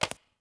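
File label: bad run stone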